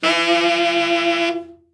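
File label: TENOR overblow, Sax; TENOR VEL-OB; Giga Samples Collection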